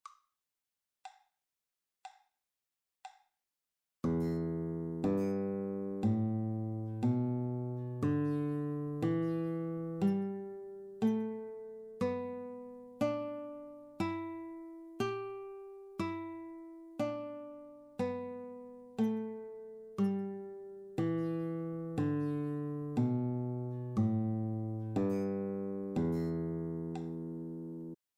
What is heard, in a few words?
E Pentatonic open, guitar